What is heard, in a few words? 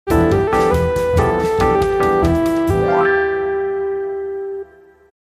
Latino Games